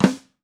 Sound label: Brass Trap Flam, Snares; Drums; Ghosthack - Free Snares 2023; Blk Brass